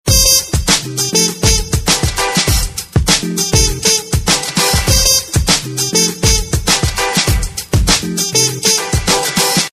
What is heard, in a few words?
Retro Beat; LG GS505 Sentio